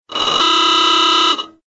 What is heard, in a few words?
sound aoogah